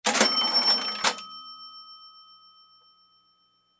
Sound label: Cash Register, Cash Register Drawer Open; Office; Blastwave